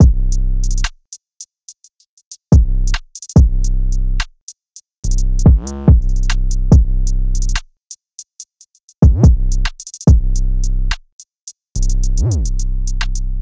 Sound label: Drum Loop (143 BPM)